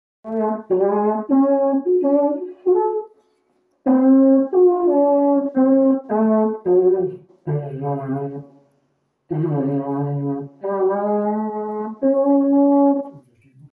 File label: serpent